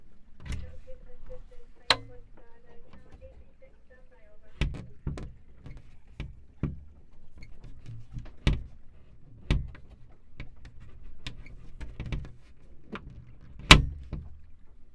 Sailing

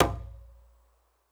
Kendang, Gamelan

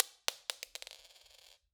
Drop On Floor, Medium Wine Glass

